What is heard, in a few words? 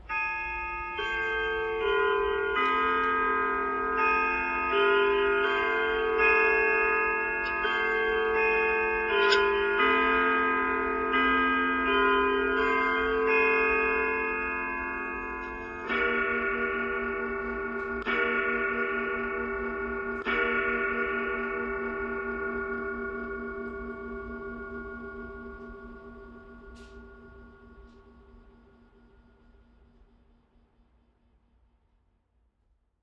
Chime